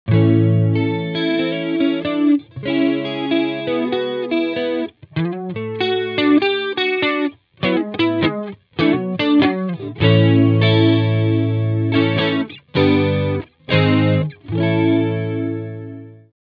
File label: Stereo Chorus